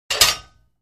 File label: Free Weights Exerci